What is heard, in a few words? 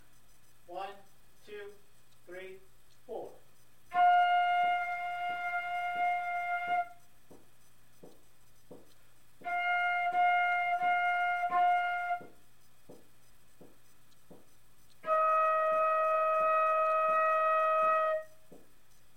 flute tongues